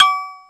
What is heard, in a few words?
Percussion